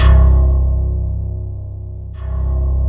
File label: C1, SynthLine